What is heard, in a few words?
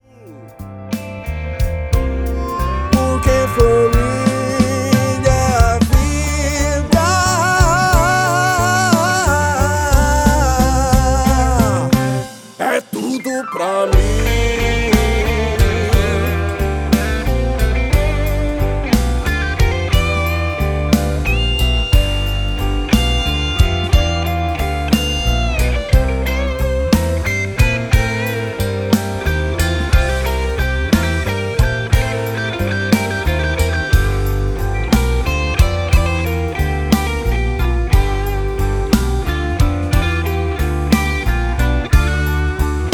BLUES